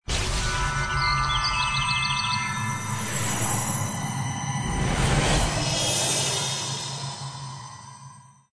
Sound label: hp rain; croods; battlespecial